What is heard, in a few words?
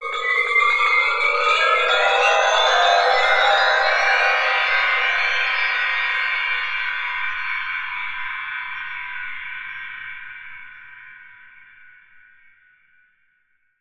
WEIRD WIND CHIME; eShop